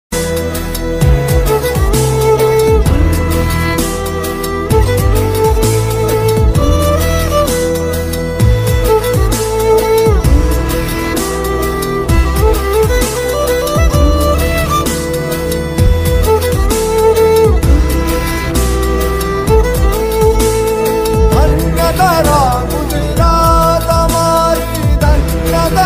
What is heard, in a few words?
BGM ringtone